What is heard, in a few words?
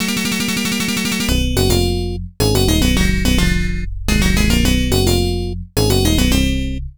Piano Solo; Lurch's; The Addams Family; Genesis